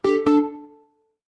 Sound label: ui alert